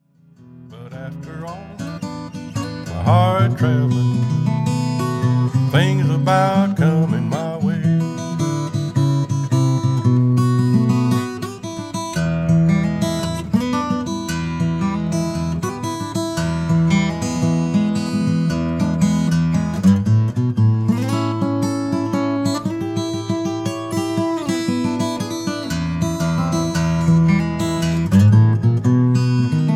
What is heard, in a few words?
guitar sample